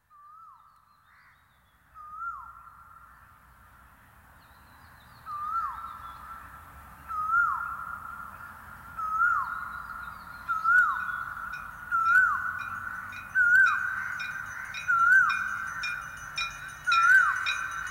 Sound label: Varanasi Dawn, INDIAN INSTRUMENTAL